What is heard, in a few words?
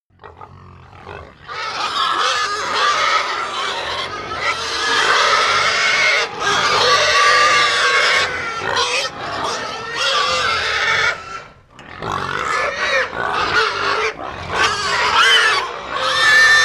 pig herd scream long, pig